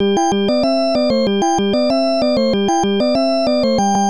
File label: Round Clear; pepen ga pengo; genesis